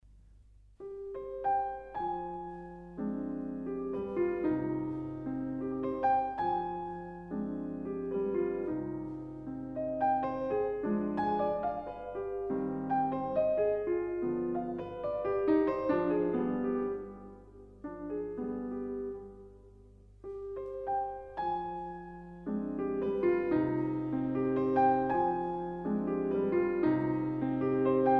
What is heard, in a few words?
Valses mélancoliques for piano